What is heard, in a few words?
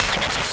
Glitches; Antidote Advent